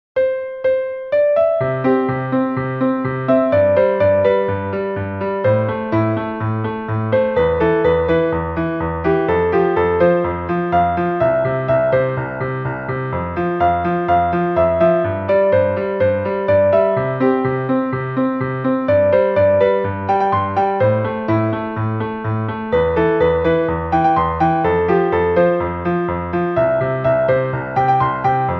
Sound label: piano